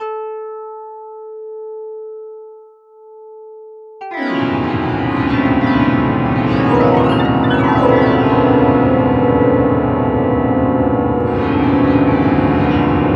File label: Piano sound